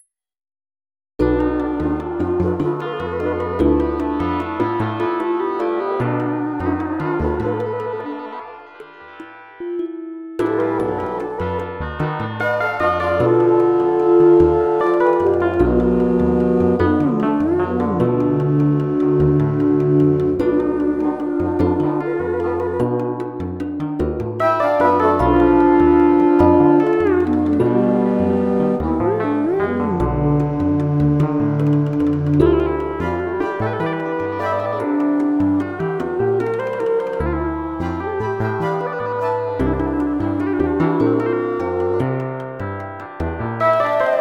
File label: drum